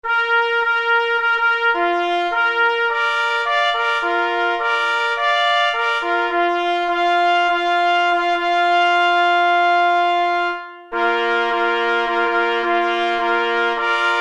Pobudka spalska na 3 plesy; marsz-fanfara z około 1905 roku towarzyszyła spalskim myśliwym

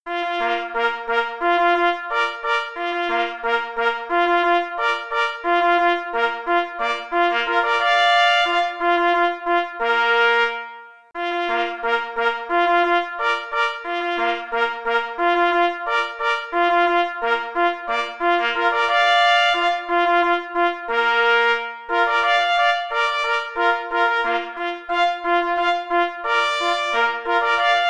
Marsz; J. Rosner

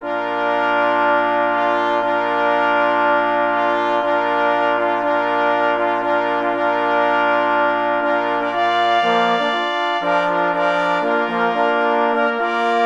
Hejnal bydgoskich sygnalistow na 2 plesy i 2 parforsy; Cz. Trybus

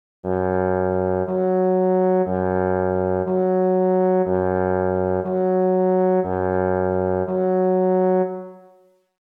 Ostrorog Potrebowanie szczwaczy; pies w muzyce lowieckiej

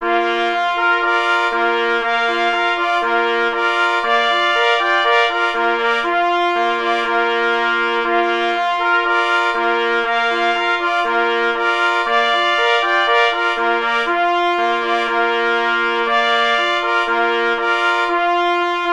Fanfara, na 3 plesy; B. Kopera; Fanfara Psiarzy Galicji; pies w muzyce lowieckiej

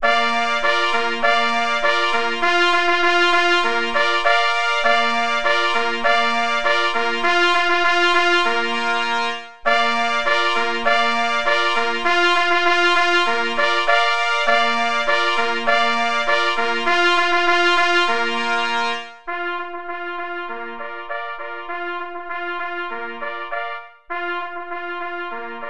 na 3 plesy; Posokowce gora

